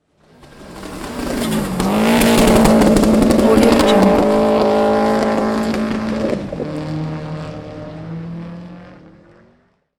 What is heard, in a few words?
rally car on racing